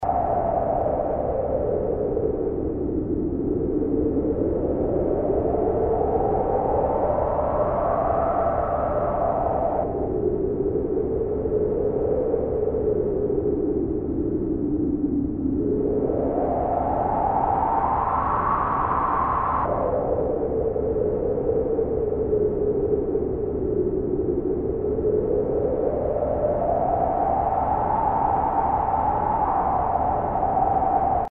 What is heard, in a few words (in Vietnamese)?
Âm thanh nơi hoang vắng không có người